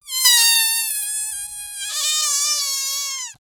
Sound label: the sound of a balloon; unhappy